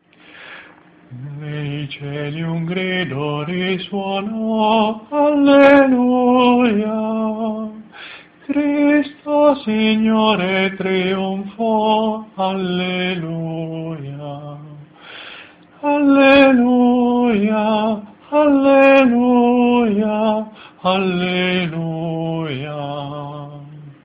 nei cieli un grido risuonò soprani, coro

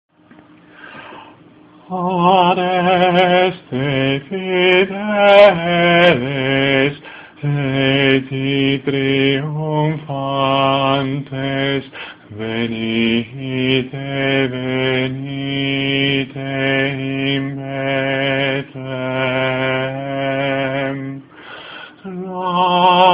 adeste fideles contralti, coro

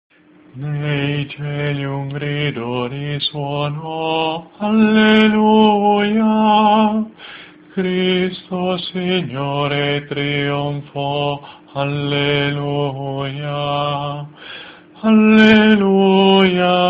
nei cieli un grido risuonò contralti, coro

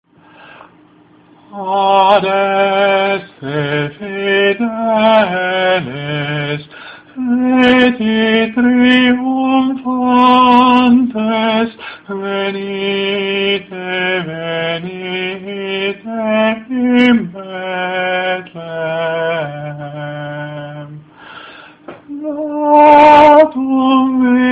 adeste fideles soprani, coro

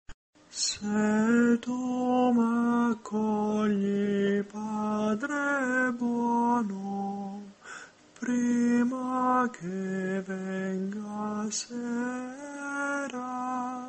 se tu m'accogli tenori, coro

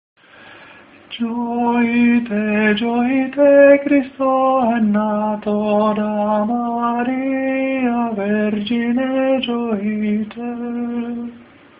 soprani

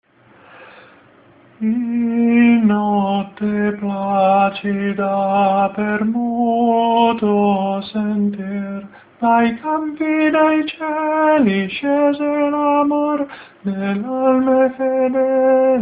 in notte placida tenori, coro

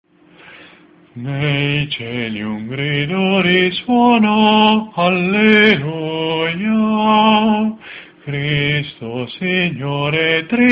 nei cieli un grido risuonò bassi, coro

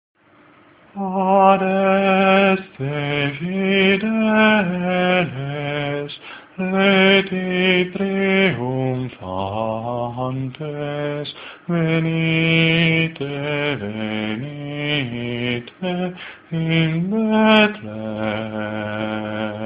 adeste fideles bassi, coro

